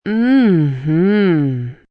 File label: "Mm Hm" Pleasure, female; Reaction; Exclamation Sound Effects